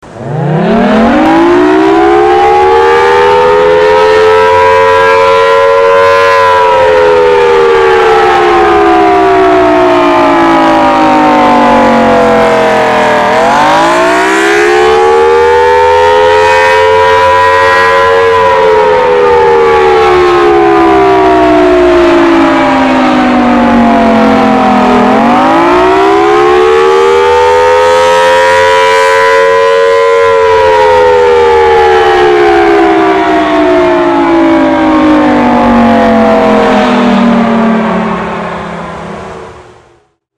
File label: air raid